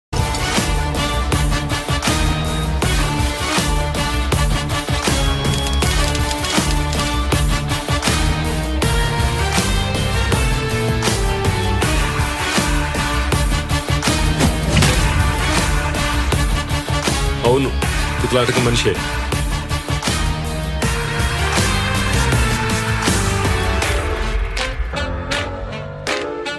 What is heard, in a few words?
movie ringtone south movie ringtone; ramarao on duty